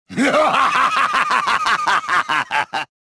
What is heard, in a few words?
deflect; reborn